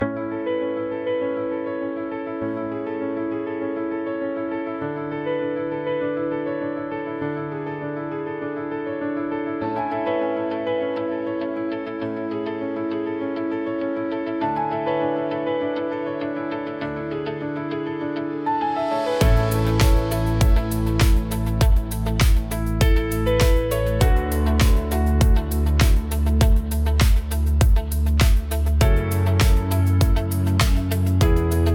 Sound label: corporate background music Calm and professional